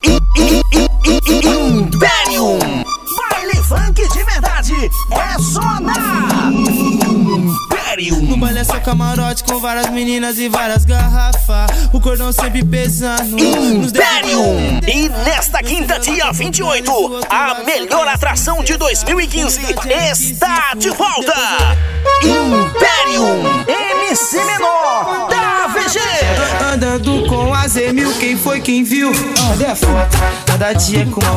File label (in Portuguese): baile funk